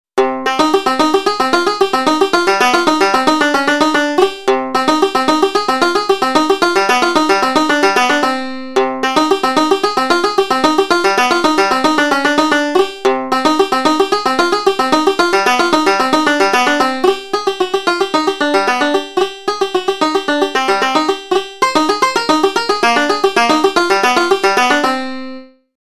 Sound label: Jig; Hailstorm Jig